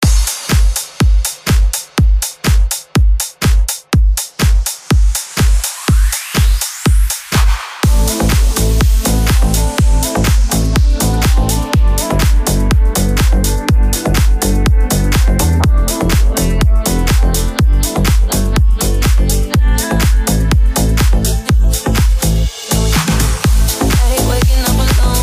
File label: future house redrum 123 bpm